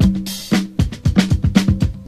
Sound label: space funk, Drum loops